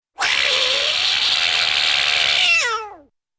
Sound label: yowls; figaro